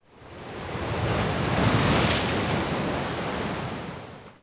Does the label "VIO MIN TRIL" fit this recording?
No